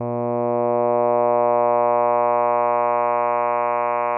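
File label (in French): A, un "a" d'une voix de basse, variation de la phase d'ouverture relative Oq de 0.5 à 0.05, sans vibrato; AteliersHenrich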